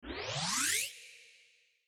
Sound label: Whooaaaaa